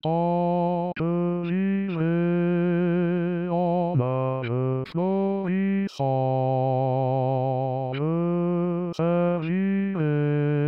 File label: bas; sermisy; tant que vivrai